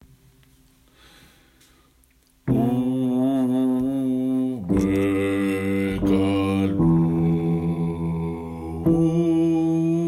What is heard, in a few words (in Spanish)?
Bajos